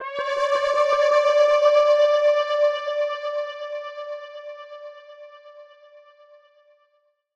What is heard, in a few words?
ACCENT Deep Dive, 147 BPM D Min; Ear Candy; Cymatics; ANALOG EVOLUTION Beta